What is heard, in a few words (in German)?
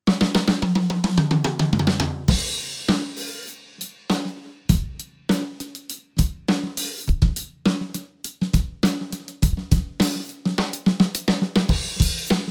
Drumkit komplett; Fame MS Drumkit Pro